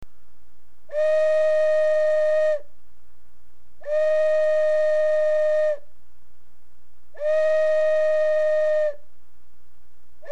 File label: dove call